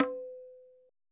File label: perc, Roland MC-909